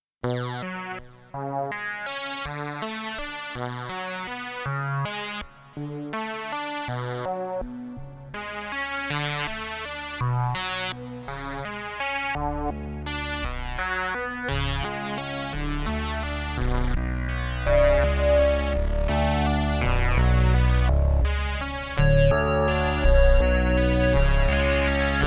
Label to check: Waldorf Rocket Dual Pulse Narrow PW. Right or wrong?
Right